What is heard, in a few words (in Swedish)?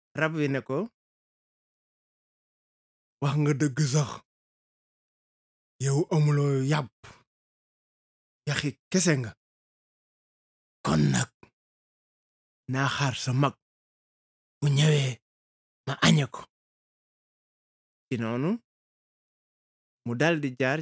bip